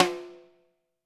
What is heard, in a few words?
SD, Drums; Bob Clearmountain Drums I